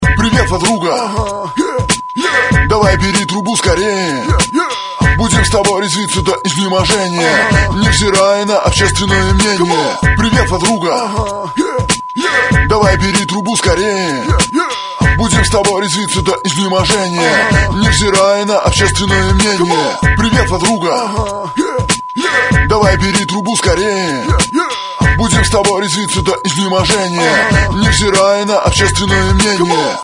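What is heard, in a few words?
hip-hop ringtone; zvonok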